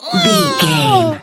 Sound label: voice female hurt pain